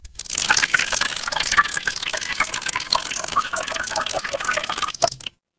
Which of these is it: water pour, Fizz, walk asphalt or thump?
water pour